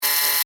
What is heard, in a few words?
Error Tone Buzz, Menu, Screen, Science Fiction